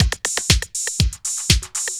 120 HOUSE, drums

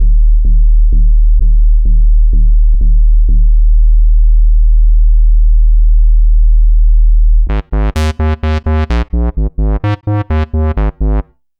Dub Bass, EDM; Sonic Foundry (Sony Creative Software); Afterhours EDM; Retro Fit

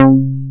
C4, J-106 BS; Drums In Your FACE!

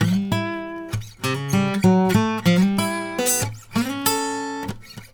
Blues Guitar Samples; ILIO; Fretworks; 96 HIRUM LICK

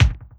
bass drum, Drum & Bass, 160 BPM; Ueberschall; Drum & Bass Resonance

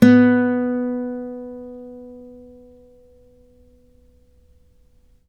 ACC FG B, Acoustic Guitar; East West; Steve Stevens Guitar Samples Collection

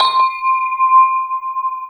CYMB, 140 BPM; Ueberschall; Drum & Bass Resonance

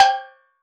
Steel Drums Layer G5, Calypso Steel Drum; Percussion

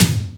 JD800 Dance Card Drums

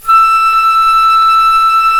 flute, C flute

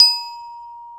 A#3 glock; InVision Interactive; Lightware VOL-1; Instruments & Percussions; GLOCKENSPIEL

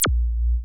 REZ THUD; OTHER DRUMS; Rubber Chicken Software Sample Bank; DRUMS + PERC

